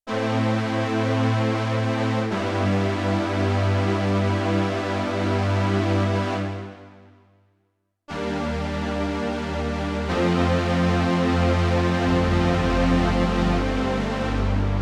FADE, Analog Strings, Yamaha TX16W

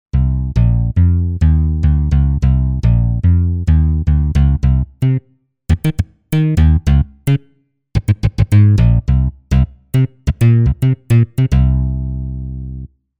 Bass Snazz Bass

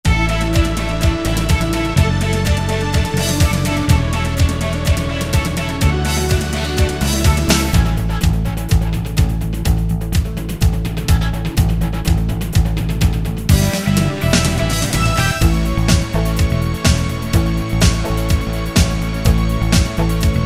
16-beat Rock, Yamaha QY70; Preset Styles

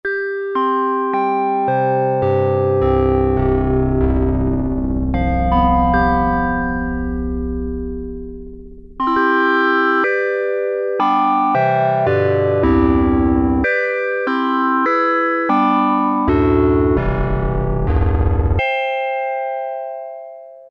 Chime with Chord Memory, Korg PolySix; Factory Presets Bank A

